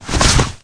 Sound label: arm, c4; weapons